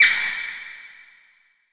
bat spawn; Blud Mage; spawn the bat

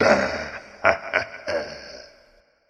wohoho, Demons; Blud Mage; StudioFreresChasseurs

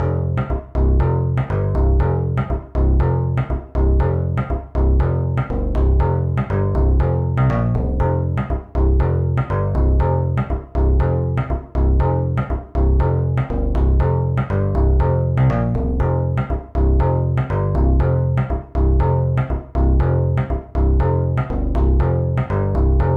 School Bass

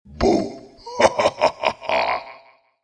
wohoho, Demons; Blud Mage

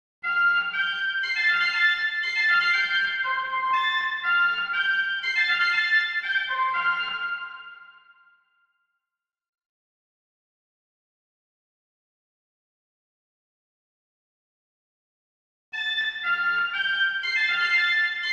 School Flute

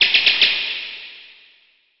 bat spawn; Blud Mage; spawn the bat

